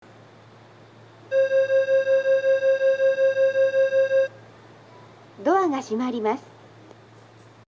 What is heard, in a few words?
melody; myogadani